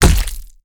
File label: impact body heavy splat squelch guts bones break; zapsplat